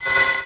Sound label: klaxon, Train; Fgc a11; OpenBVE